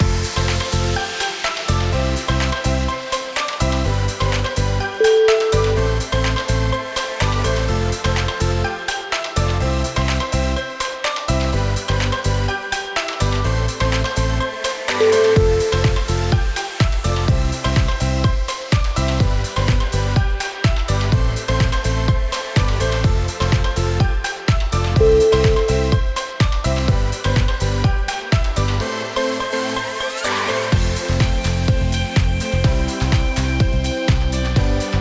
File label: tropic corporate